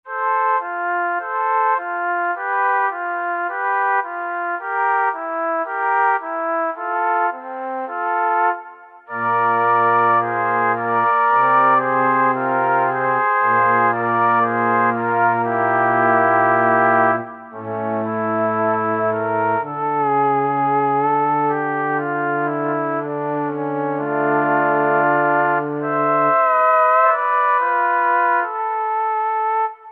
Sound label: Mellowtron Flute, Roland JD-990; Factory Patches